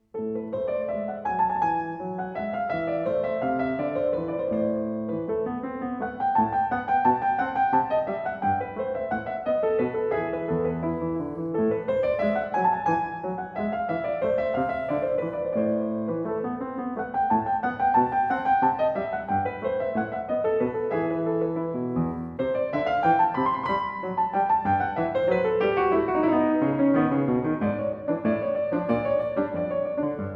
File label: Aria variata alla maniera italiana, in A Minor Variatio V. Un poco allegro; Bach; Ashkenazy; BWV 989